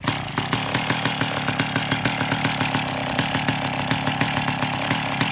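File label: Clanky Chainsaw Idle; chainsaws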